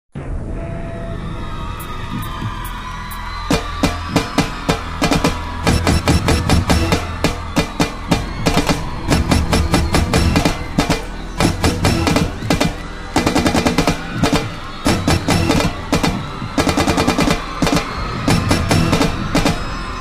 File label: groove